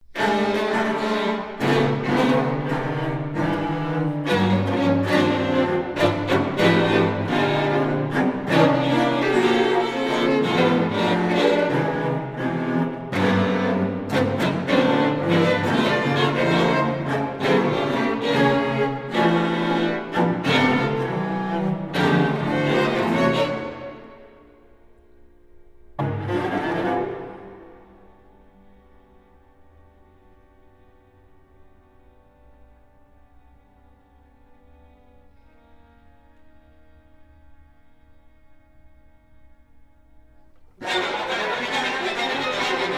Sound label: String Quartet Presto furioso Brutale Tumultoso